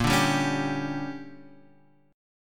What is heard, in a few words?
D Major 7th Suspended 4th Sharp 5th